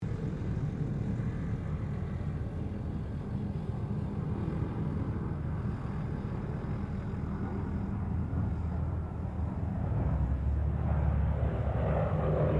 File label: AIRCRAFT TROJAN SQUADRON 4 PASS DISTANT